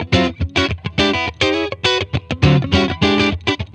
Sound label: SNAKEY, Funk Guitar; 90 s Sample CDs; Zero G